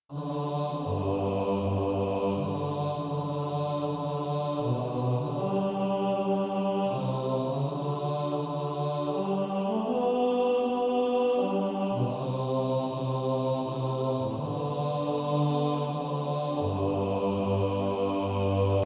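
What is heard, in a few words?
bass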